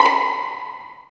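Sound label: Violins Pizz; STR; Roland L-CD702; Vlns Pizz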